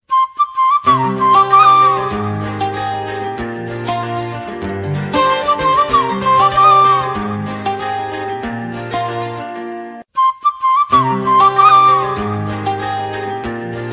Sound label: Romantic Instrumental Flute Ringtone; ringtones